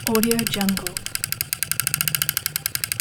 synchronizer spin 35mm film rotating with break; چرخش فیلم 35 میلی متری; interface sounds

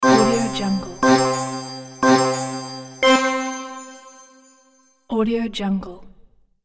game play countdown

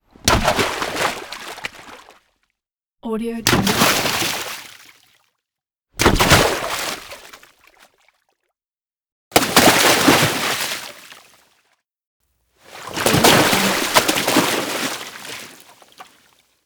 water splashes punchy body splashes into water; game sounds; انداختن چیزی در آب

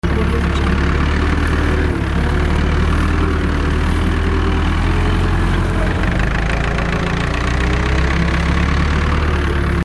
tractor heavy driving short loop; industrial sounds

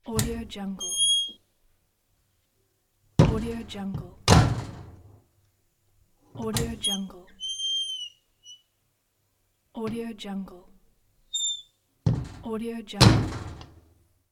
metal door opening and closing; domestic sounds

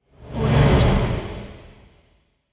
whoosh; transitions and movement

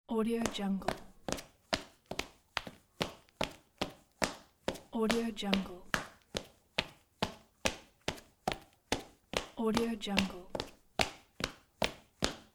running in heels on tiles; دویدن با کفش پاشنه بلن; human sounds

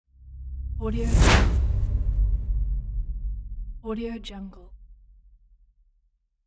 whoosh boom; transitions and movement

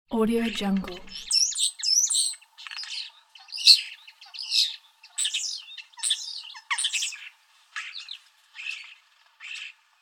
starling singing; آواز سار; nature sounds

